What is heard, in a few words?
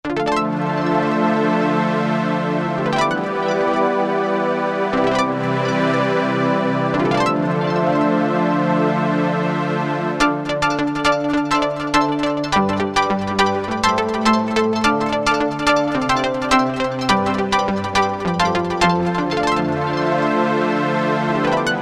Synth Chord; Roland SRX-05 Supreme Dance